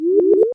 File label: Deliver